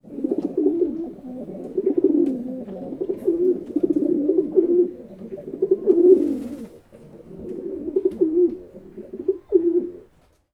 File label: Pigeon noise